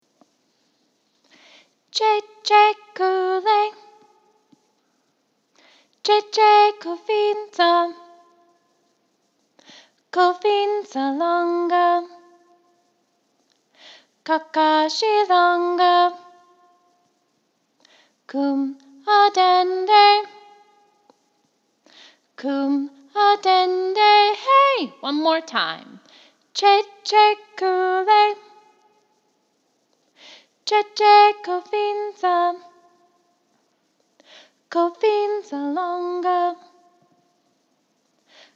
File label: Kye Kye Kule vocals with Echo, children's choir